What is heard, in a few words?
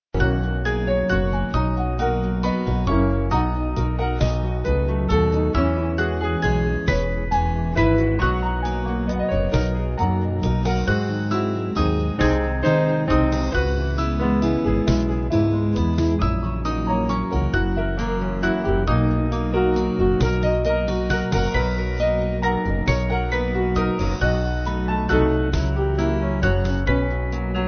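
Band, Music Backing; Why Should I Be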